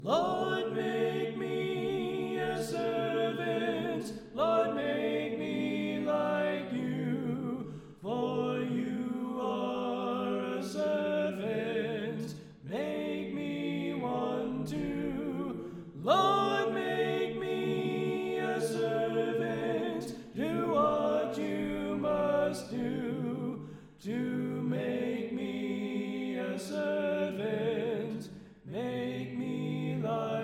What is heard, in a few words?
Alto Left